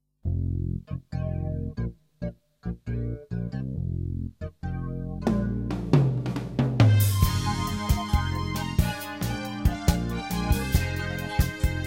Quick Rhythm, modern; istd

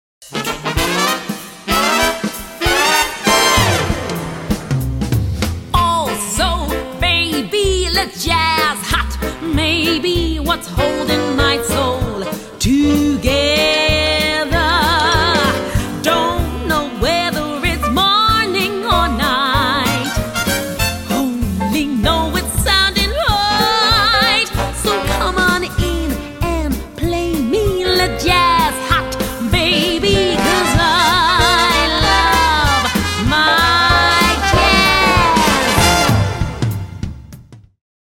THEATRE TAP; tap intermediate

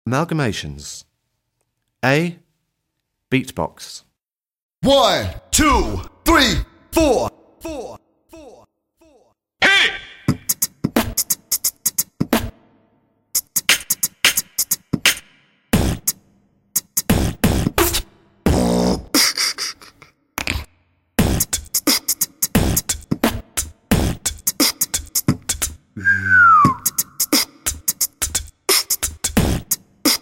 Beat Box; tap grade 3

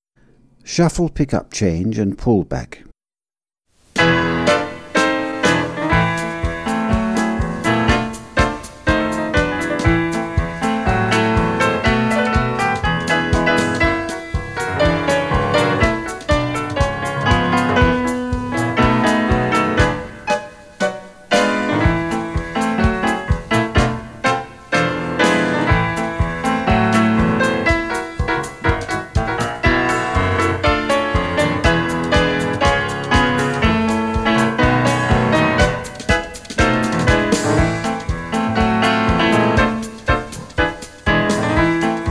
Shuffle pick up change pullback, tap

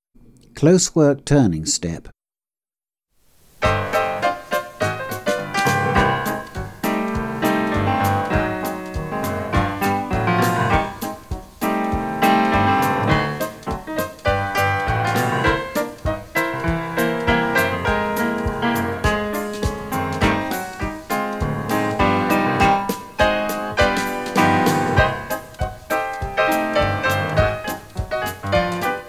Closework turning step with change of tempo, tap

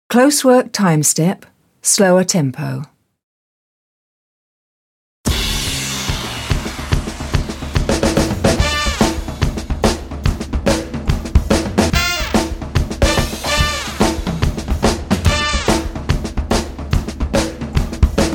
Closework Timestep slower tempo, tap

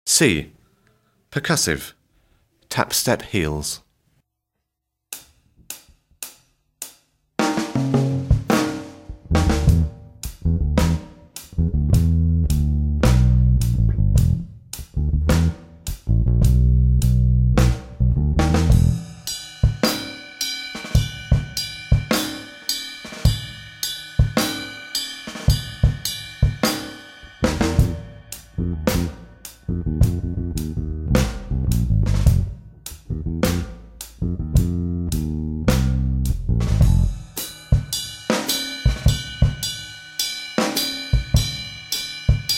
Percussive Tap Step Heels; tap grade 2

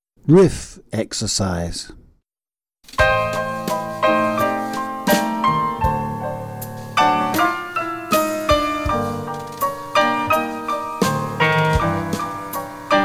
Riff excercise, tap

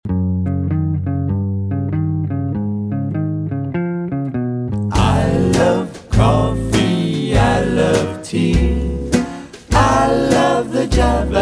Barre Nerve Taps, Tap

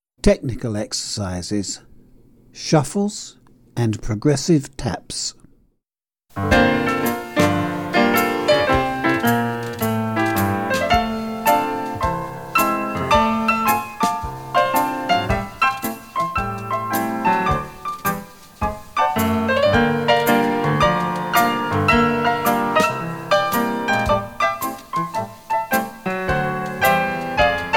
shuffles and progressive taps

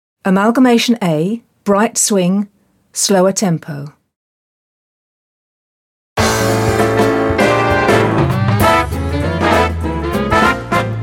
Bright Swing slower tempo

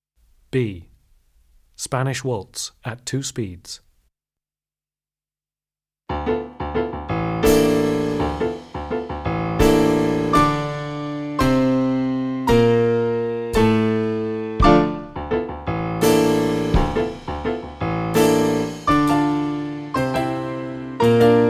Spannish Waltz, tap; tap advanced 2

